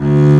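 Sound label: double bass D2; AKAI S-Series CD-ROM Sound Library VOL-8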